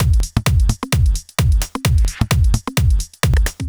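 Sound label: snare, Drum Loops 130bpm; Zero-G; Soundclash Nu Dance Electro; Acidized Wavs; Hard Mean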